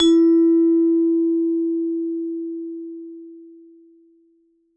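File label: vibrating sound of note G first octave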